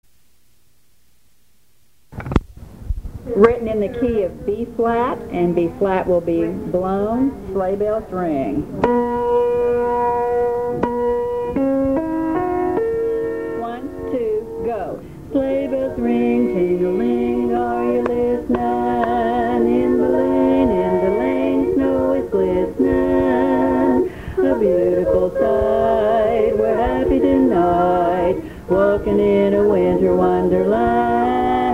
Winter Bass, chorus

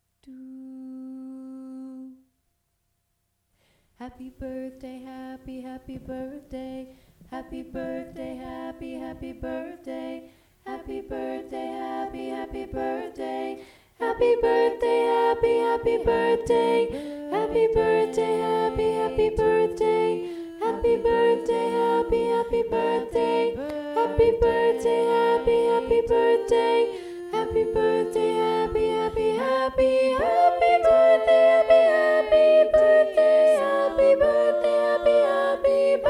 Happy Birthday Doo Wop Tenor

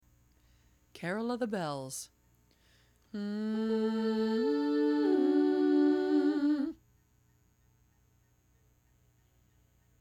Carol Bells tenor missing; Bells tenor omit

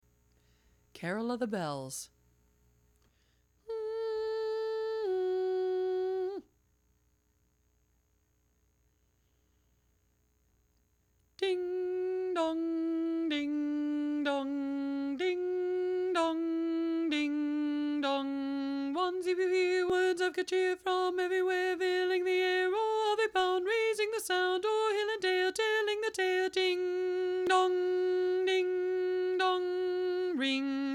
Carol Bells lead alone

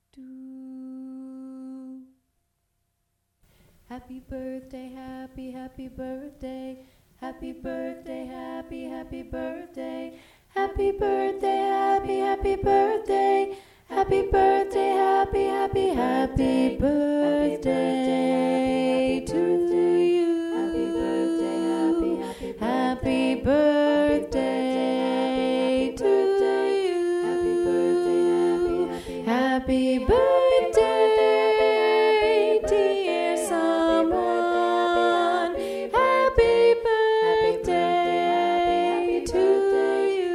Happy Birthday Doo Wop Lead

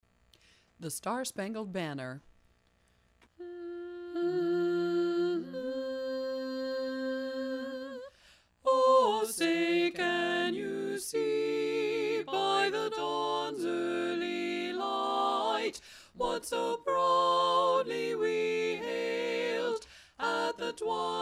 tenor dom; SSB

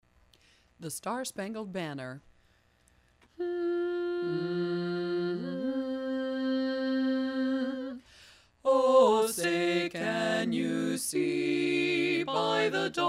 SSB tenor miss; SSB tenor omit

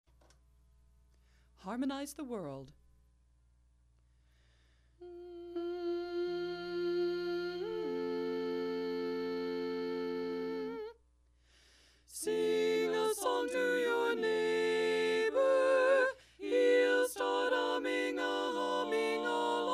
tenor dom

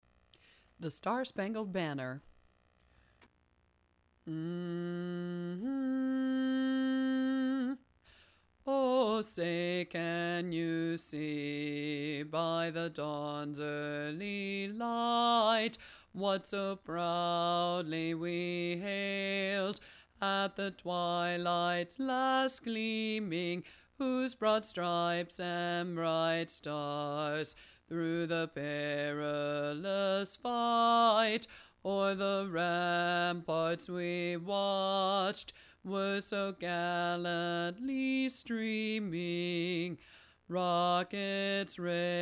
bass alone; SSB

